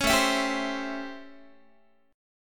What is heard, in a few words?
A 7th Sharp 9th, Guitar; A7#9; strummed